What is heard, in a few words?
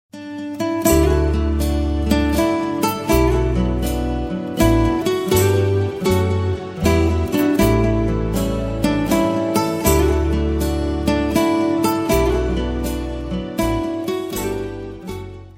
instrumental; Daily Daily sing to Mary